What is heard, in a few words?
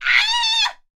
horror female scream